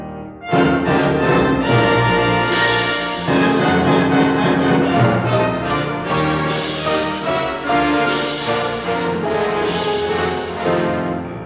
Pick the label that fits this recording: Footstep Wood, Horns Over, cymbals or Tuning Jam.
cymbals